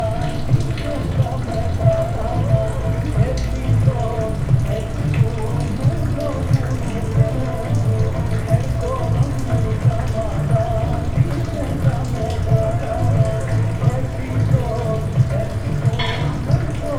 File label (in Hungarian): bazar vendeglobol kiszuremlo arab zene csatorna